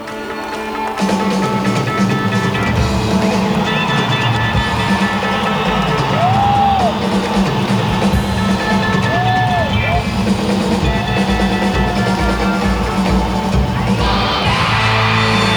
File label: Drum Solo; Los Angeles 1980 2nd Night; June 24, 1980